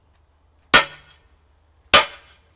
metal lock hit with a sledgehammer - industrial ambience